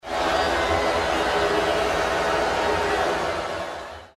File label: Boo